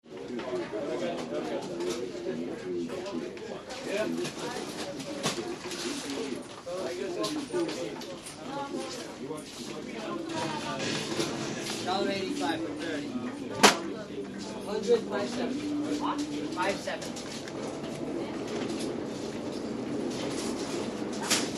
CROWD -STORES AND HALLS DRUG STORE: Faint music, busy.